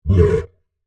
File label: Monster Grunt, Horror, Monster, Grunt, Creature; Monsters; BlastWave